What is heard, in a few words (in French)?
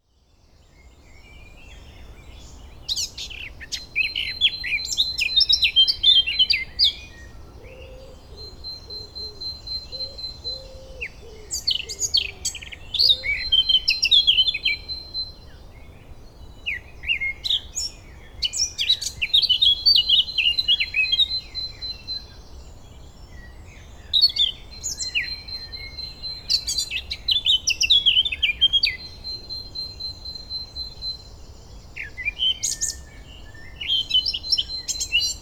oiseaux